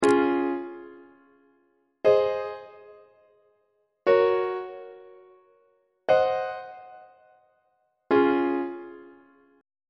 d flat major leading tone iac on treble clef

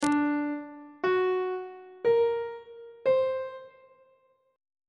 d augmented 7th chord intervals; treble clef

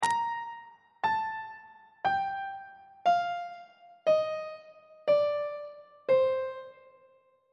b sharp dorian mode descending on treble clef

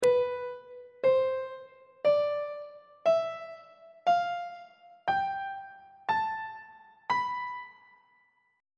locrian mode on treble clef

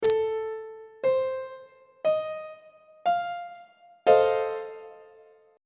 f dominant 7th 1st inversion on treble clef

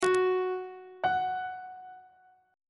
g flat note on treble clef

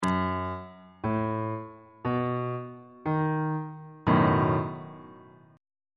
c flat dominant 7th 2nd inversion

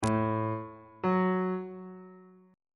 relative minor of a major is f sharp on bass clef

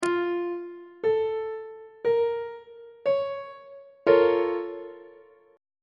a sharp minor major 7th 2nd inversion; on treble clef

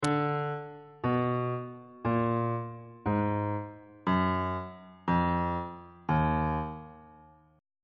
e flat harmonic minor scale descending

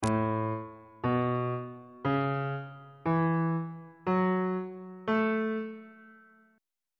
a major pentatonic scale on bass clef

